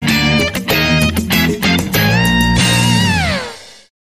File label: Funk Strut, Sting